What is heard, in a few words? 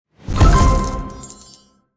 Door Button Appear